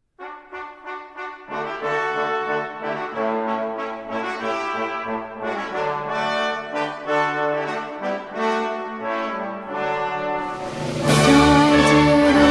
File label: tenor